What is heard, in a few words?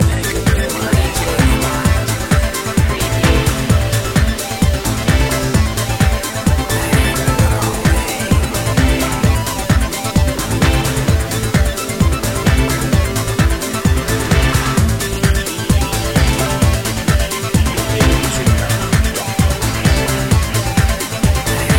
thrillseeker loop